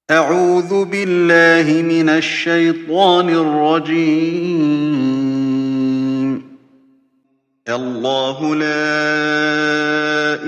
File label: Aayat ul Kursi; Sheikh Hudhaify; with Arabic text